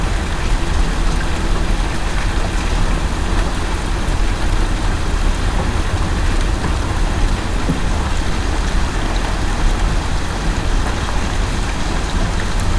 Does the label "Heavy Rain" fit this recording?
Yes